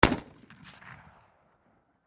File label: Warfare Rifle 7.62 Caliber Howa M1500 Bolt Action Single Shot Distant Perspective; SFX Bible